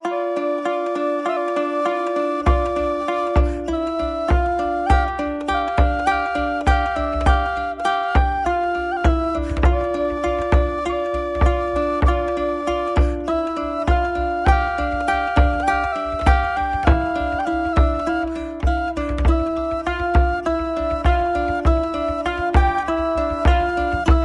vocal kitara drum flute

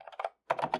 Friend-Typing; viewfinder

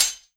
Sword Hit Impact

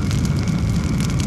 Poison Target

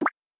Animations Repair Small

